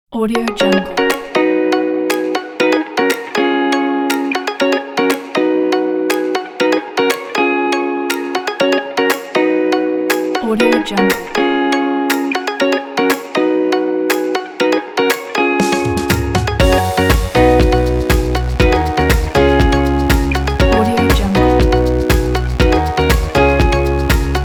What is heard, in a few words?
happy fun